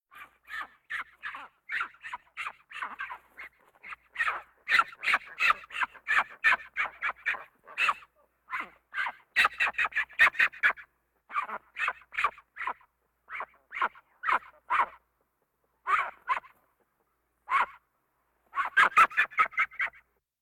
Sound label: Monkey little monstersounds; KMP Film Video Sound Effects